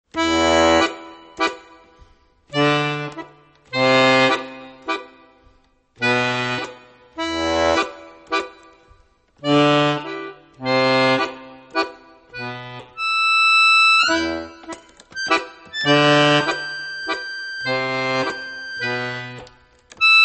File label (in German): Walzer